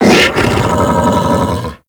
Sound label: Infested Juggernaut Vocal Idle; Warframe